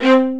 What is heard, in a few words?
Spicato Basses & Celli